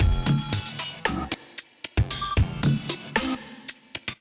64 Pads Penelope Kit groove 114 bpm, Drum Essentials; MIDI Clips; 111 - 130 bpm; Ableton; Factory Packs